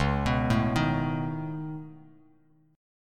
C sharp 7th Suspended 2nd Sharp 5th, C sharp, down, Bass Standard; arpeggiated; chord